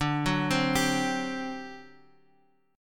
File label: D 11th, Guitar, Half Step Down